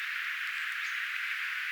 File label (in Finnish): tuollainen rastaan aani